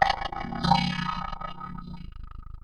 Ring Mod, Cymbal, contact mic; Murf & Ring Mod

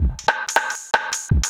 Beats, Synchro Funk; Stikman; Sonic Foundry (Sony Creative Software)

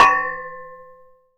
Pan Clank, household rhythm samples Hits

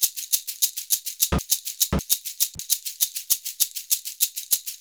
SHAKER, Modern Percussion Loops; Soundscan vol.56; AKAI; SHAKER100

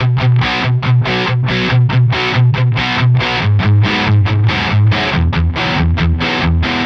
Distorted Guitar 140BPM Bmaj; Vengeance - Essential Clubsounds Vol.4

